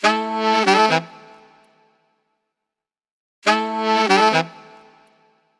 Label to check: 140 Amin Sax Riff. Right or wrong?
Right